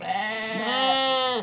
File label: sheep